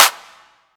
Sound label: normal hit clap